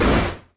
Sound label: door kick